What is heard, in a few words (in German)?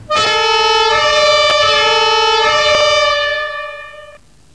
Signal